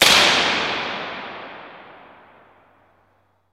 Elect Arc Lrg Discha, arcs & sparks

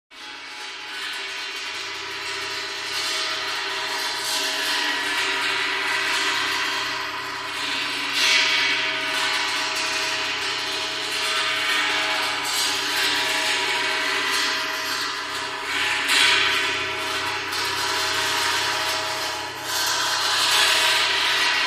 Gong Long Scrape Across

